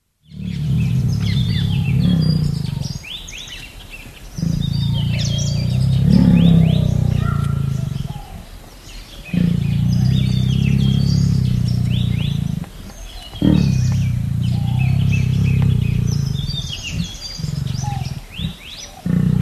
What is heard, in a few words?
roar of the bengal tiger; bbc